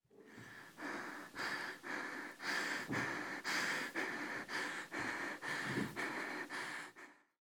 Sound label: Sob breathing, Adult Female; ko